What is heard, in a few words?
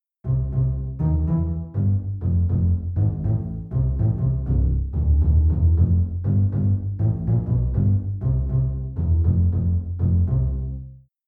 Double Bass